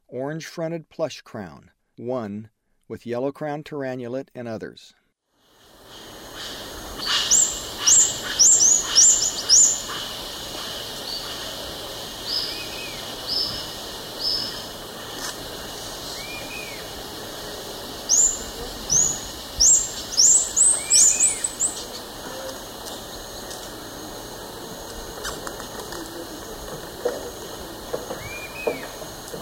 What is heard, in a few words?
Orange-fronted Plushcrown; S. America; Eastern Lowlands